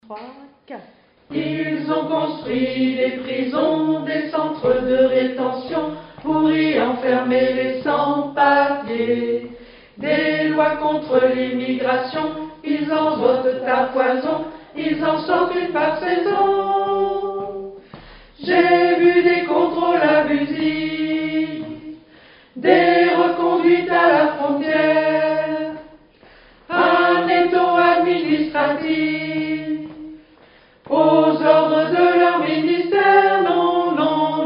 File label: alto; sur l'air des Archers du Roi